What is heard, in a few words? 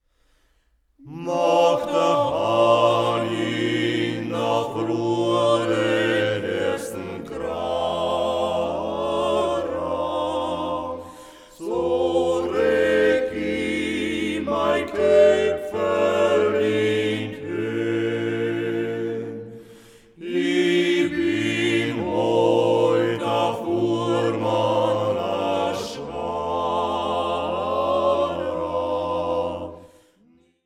acappella Fiakerlied